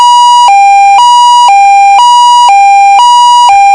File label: tone